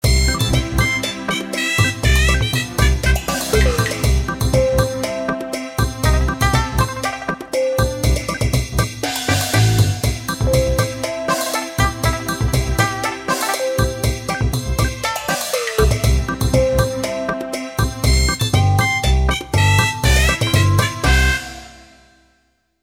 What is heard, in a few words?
YangGe